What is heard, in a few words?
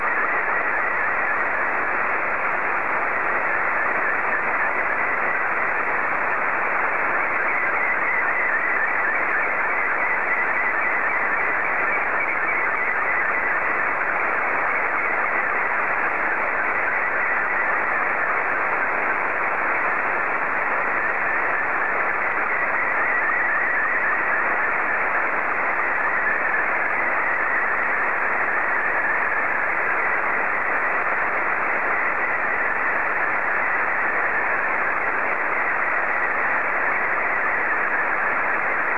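SSTV, Scottie 2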